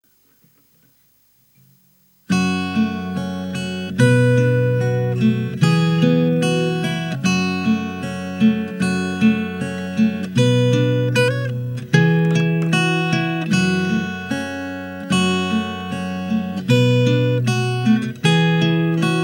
martin mini

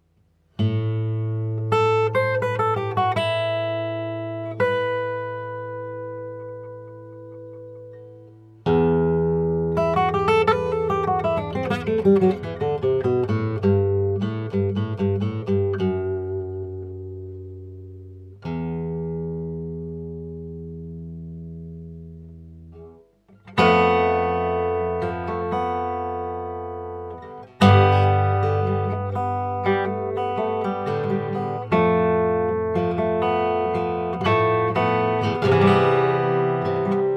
yamaha l 10 old, guitar

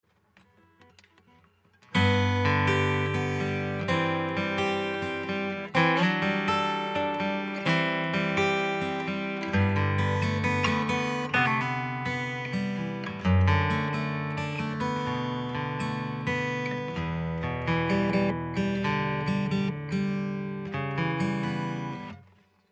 j45 piezo

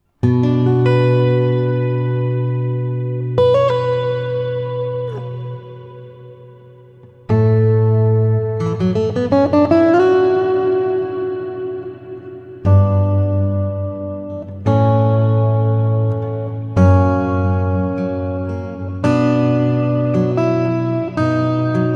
hall

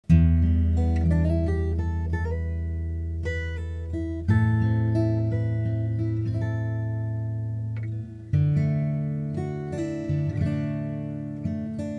condenser

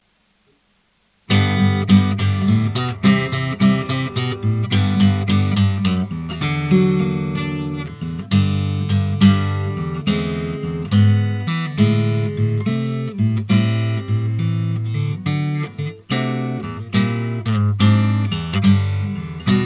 takamine

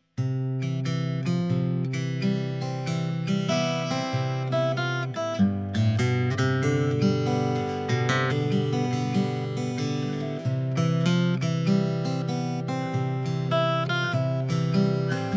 martin ooo 01, guitar

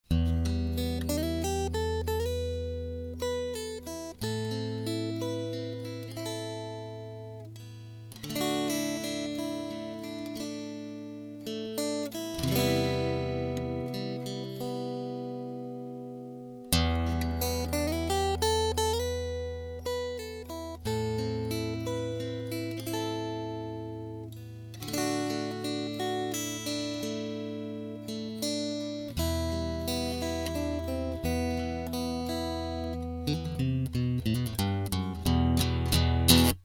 neo d h piezo

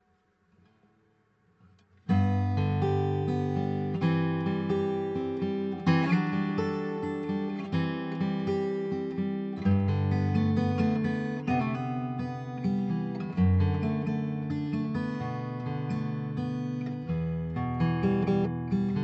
j45 mic

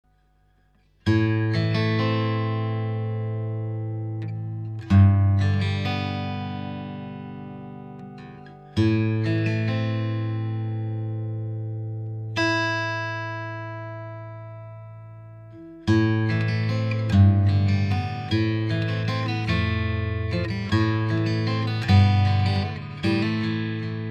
gibson sj

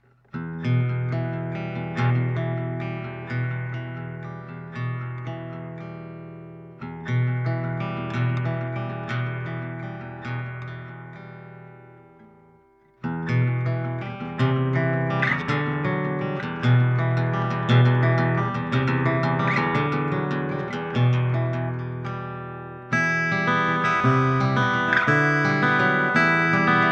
yamaha apx500, guitar

